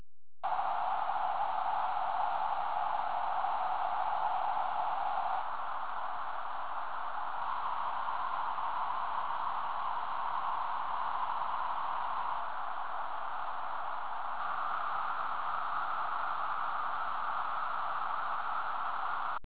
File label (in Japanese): band 3, 1/3オクターブバンドノイズ / Lch:1/1オクターブバンドノイズ / Rch:1/3オクターブバンドノイズ / ステレオ型式 -20dB